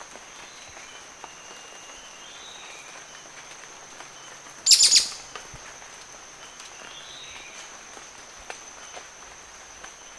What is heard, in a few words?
red billed scythebill